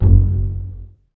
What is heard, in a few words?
BS PZ F#1, strings pizz; ILIO; Kirk Hunter; Virtuoso Strings